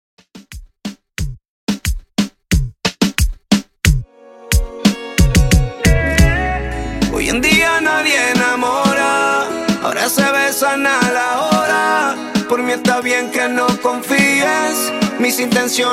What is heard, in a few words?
party reggaeton 90 bpm